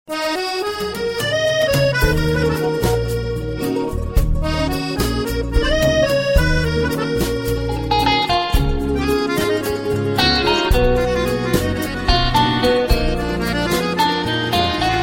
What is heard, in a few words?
instrumental ringtone